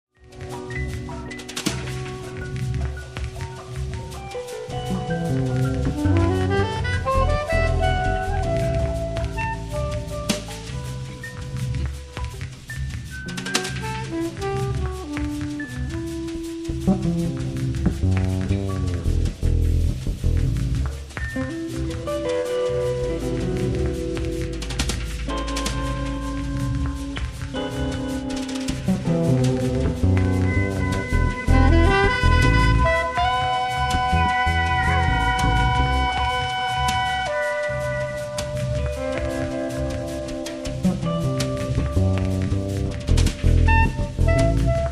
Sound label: string remix; willisau